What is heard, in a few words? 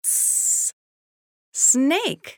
SNAKE